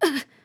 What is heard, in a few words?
damaged, RPG Voice; RPG Voice Starter Pack